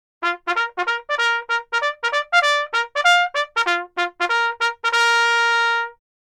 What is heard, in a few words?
Assembly, Bugle Calls